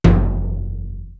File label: timpani; East West; Global Sounds